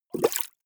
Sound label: bubbles hard